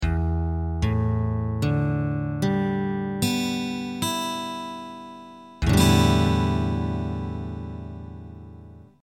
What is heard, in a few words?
Standard Tuning